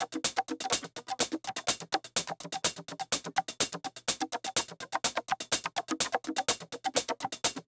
125 top perc, Nu Jazz House; 125 Beats

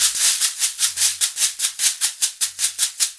SHAK, Percussion Loops; 150 COMBI

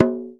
MALAY DRUMS; Spectrasonics - Heart of Asia CD2

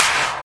Claps; Vengeance; Essential Clubsounds Vol.4

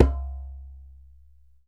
DJEMBE, African Perc

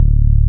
SUPER SUB, Synth Basses; E-MU Formula 4000 Series Vol. 2

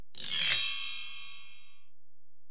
Alesis DM-PRO AcPerc; 300 Drum Machines

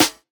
Snare, JUNGLE BREAKS

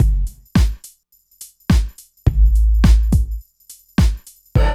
DRUM LP; East West; Phat & Phunky

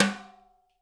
TIMBAL, BR TIMBALES; Spectrasonics; Liquid Grooves 1 & 2

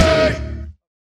guit industrial punch; Sonic Foundry (Sony Creative Software); Ugly Remnants VOL-2

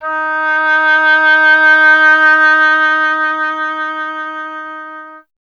oboe D; Miroslav Vitous; Solo Instruments VOL-2

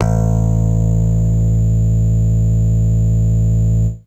a1, FAIRLIGHT Electric Bass; Retro Sampler

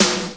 Snr 80S; Zero-G; Soundclash

